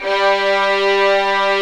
violins fff; Roland LCDP13 String Sections; STR Vls8 fff

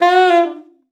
sax f#4, Saxophone slides; Session Instruments

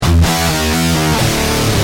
G Heavy, Guitar; Industrial

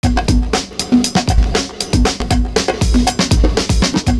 PERC, loop; Univers Sons - Kitch 70s

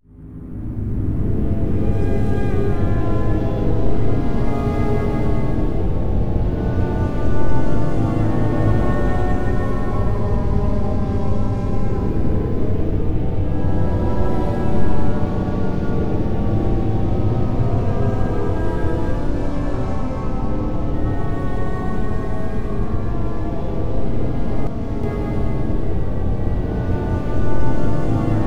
MOURNFULL; Bolder Sounds - Granular; MOURNFUL 2

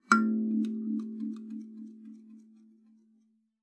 KALIMBA A3; Xsample VOL-7

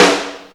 SNARE, Ragga & Dub Reggae; USB Soundscan vol.27; AKAI; SNARES KT

